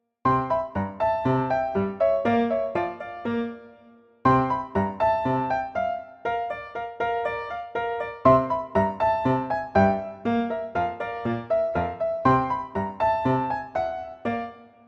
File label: bgm; math trivia live